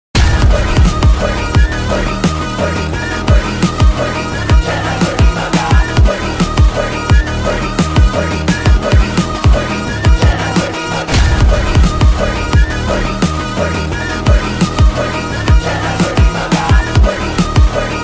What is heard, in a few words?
intro bgm ringtone; aavesham; fahad